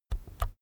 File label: keyboard external key press slow soft